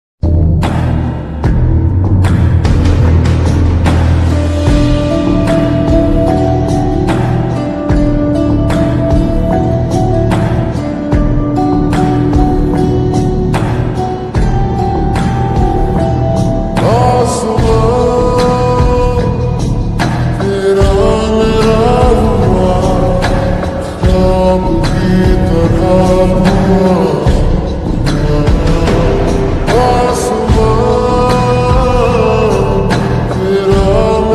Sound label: slowed reverb ringtone